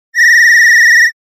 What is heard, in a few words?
megaphone